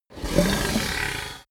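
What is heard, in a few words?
fishman breath grunt, creatures; kreaturen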